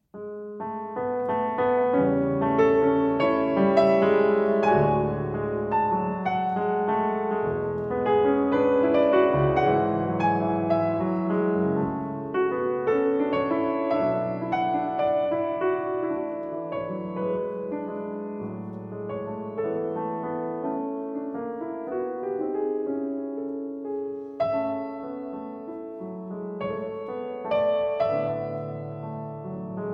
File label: Arr. for Piano Adagio; Symphony No. 2, Op. 27